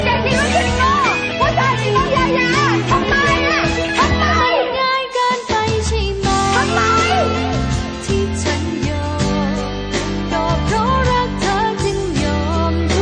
thai love song

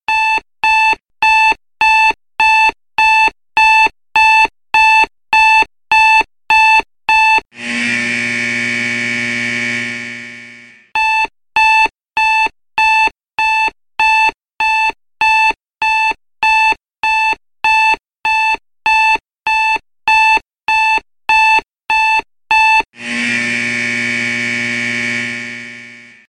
Clock Alarm Classic

